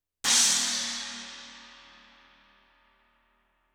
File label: 18 CHINA CRASH, cymbals